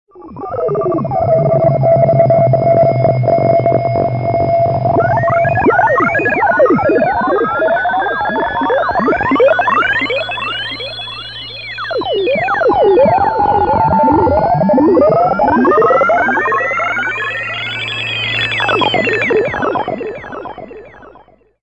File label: Samsung Ringtone